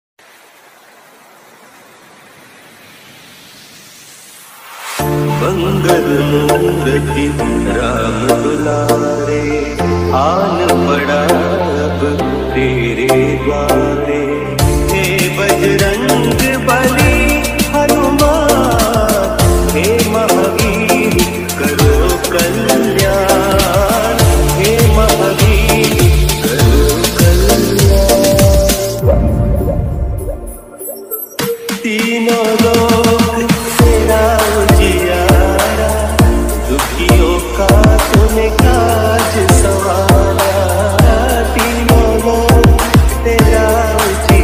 mangal murti ram dulare dj song; mangaal murti raam dulaare

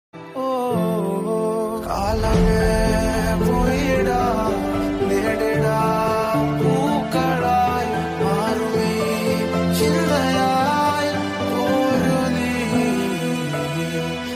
sad alone emotion bgm music

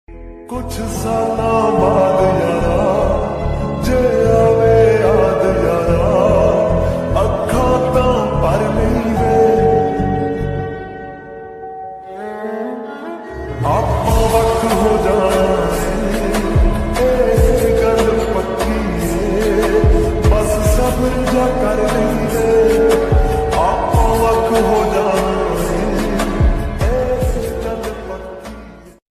sad ringtone music backround song

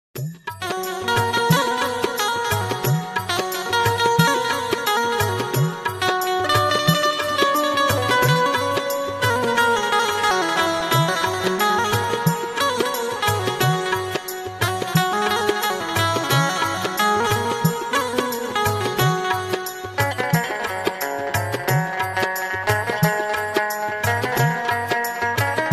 banjo ringtone bhajan ringtone; hanuman ji ringtone; bhakti

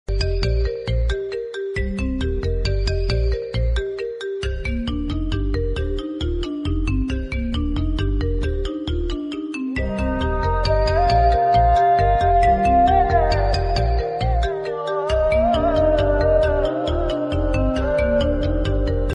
love bgm ringtone; south indian bgm ringtone tamil ringtone tamil bgm

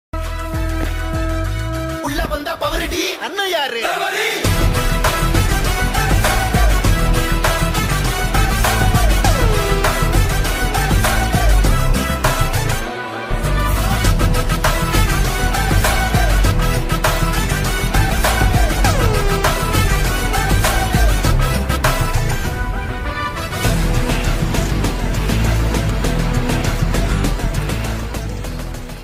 master intro bgm ringtone

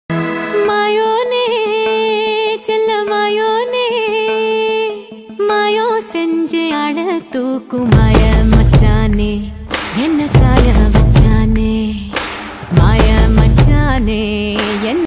song

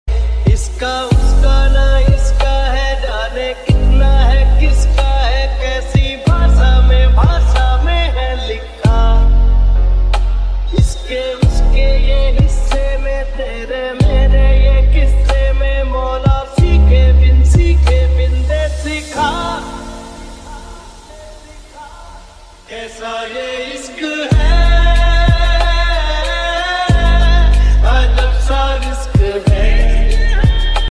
lofi remix ringtone love ringtone

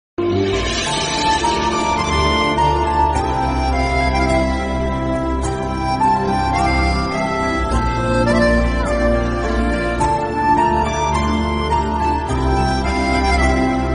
love romantic tune; divya drishti serial; rakshitdrishti bgm; starplus

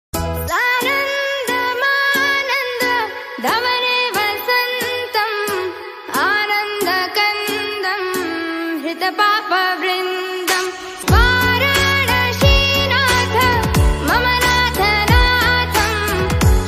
hindi ringtone; shiv ji mahakal

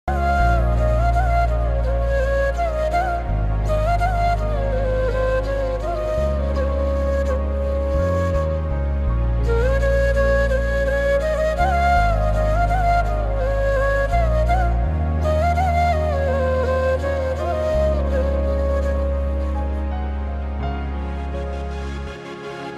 musical instrument ringtone instrumental music

